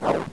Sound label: Throw; HoppyEaster; Jesus; Projectile